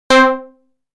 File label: Multiwave Lead